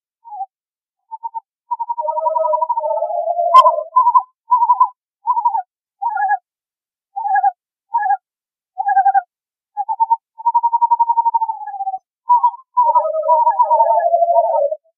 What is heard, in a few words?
screech, owl